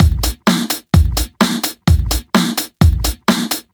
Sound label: House Beat, 128 BPM